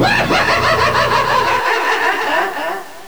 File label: ultimate deploy